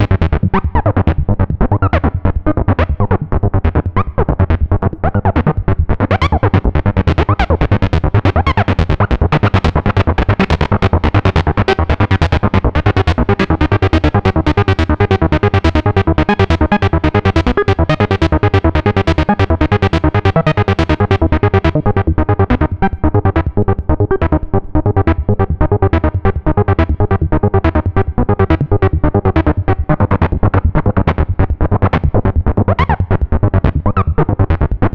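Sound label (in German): Pocket Operator Modular 400 SAW FM via Sine, Synthesizer; Teenage Engineering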